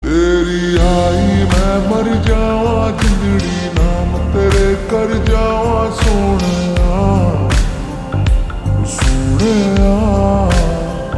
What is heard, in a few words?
Ringtone slowed reverb